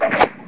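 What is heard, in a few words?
arrow fire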